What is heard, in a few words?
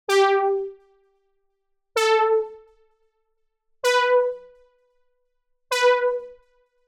Synth